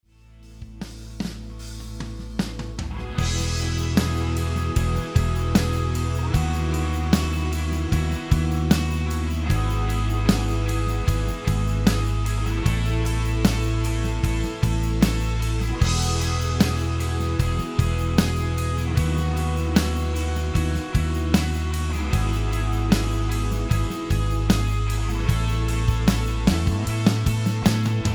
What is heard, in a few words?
rhythm